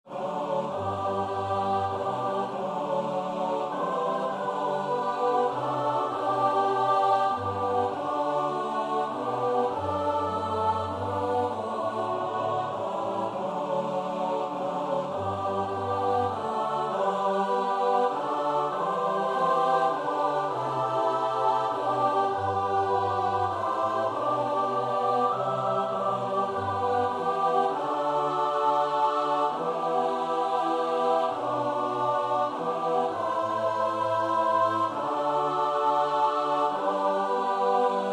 S-A-T-B; Brahms (Johannes); Abschiedslied

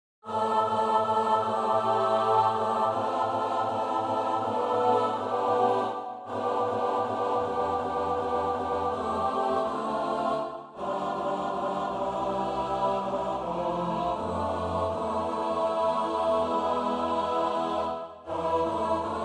S-A-T-B; Akepsimas (Jo); Agneau de Dieu

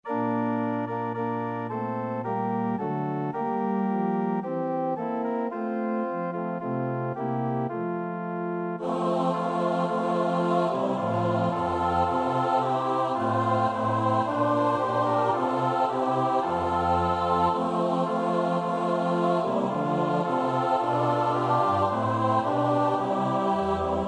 (S-A-T-B); Vesper 34; NLB 481; Hoor dé eng'len zingen d'eer

